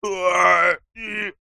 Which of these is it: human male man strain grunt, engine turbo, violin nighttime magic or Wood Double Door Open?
human male man strain grunt